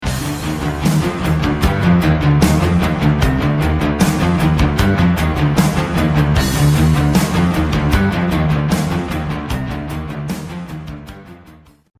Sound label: short song